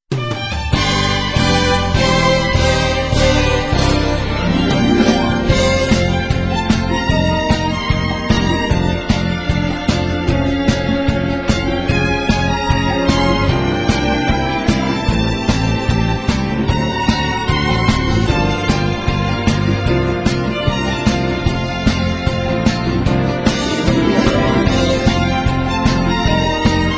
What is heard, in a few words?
instrumental